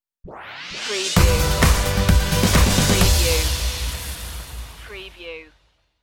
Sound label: neon stinger